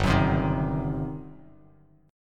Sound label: G Major 7th Suspended 4th Sharp 5th, G, down, Bass Standard; GM7sus4#5 strummed